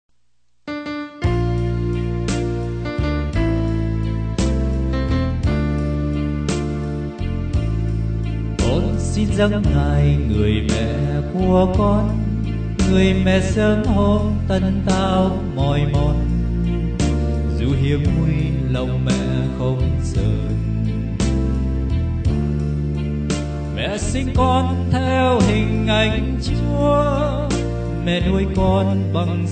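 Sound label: soprano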